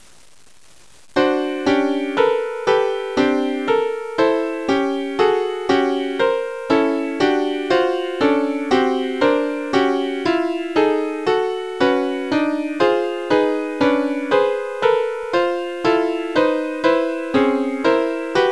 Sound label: fractal Multiple Note Generator